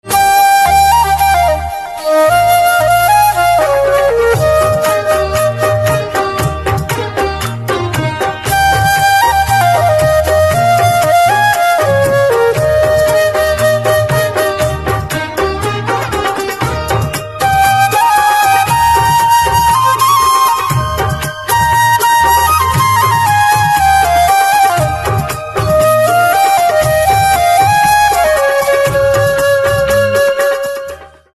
Flute

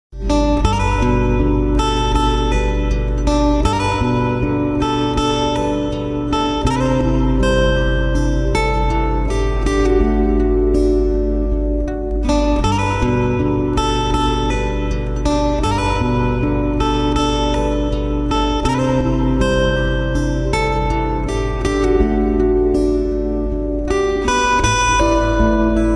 Guitar Slow

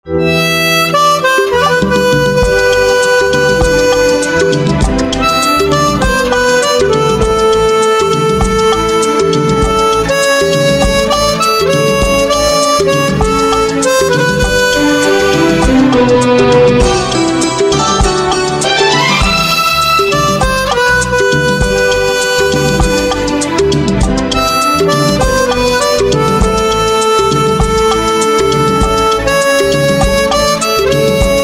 Harmonica, instrumental ringtones

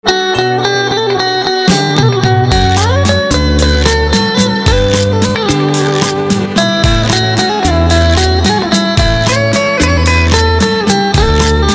Guitar

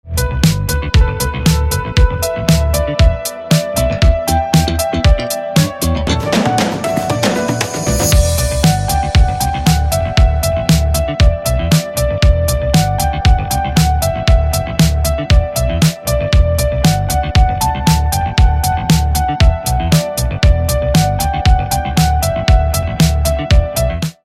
Piano

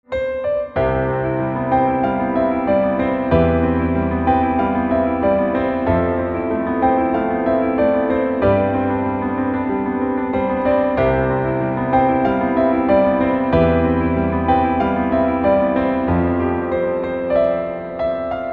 Piano

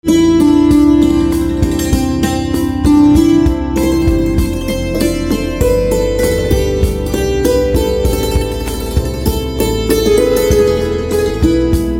Santoor

